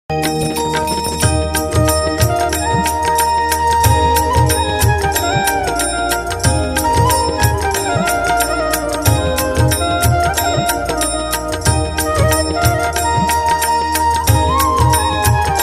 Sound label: Hanuman Chalisa instrumental; bajrangbali